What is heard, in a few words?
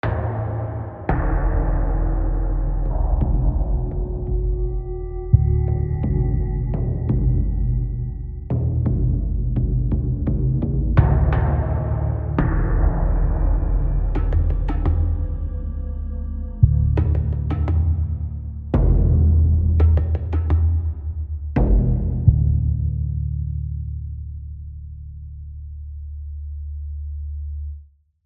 Tension; The Last of Us; AB Tension 5 High Block